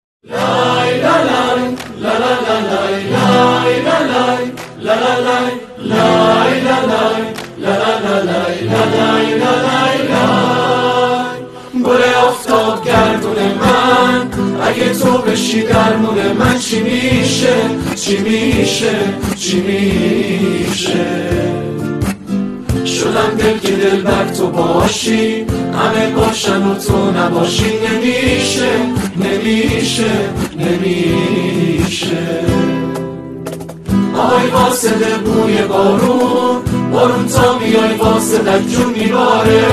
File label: Guitar